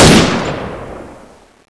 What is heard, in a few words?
tar21 shoot; weapons